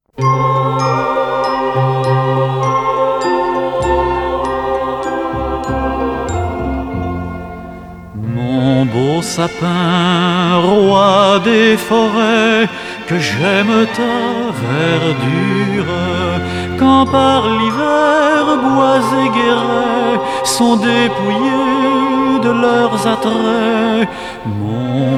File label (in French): mon beau sapin, chants; chants de Noël; version française